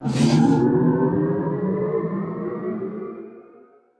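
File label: generator power increase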